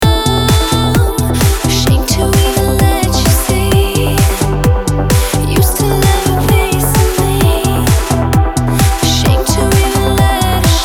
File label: Cardio Swing 130BPM